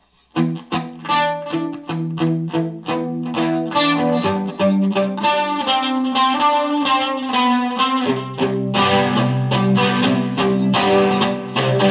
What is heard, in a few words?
E G C higher, riffs; making riffs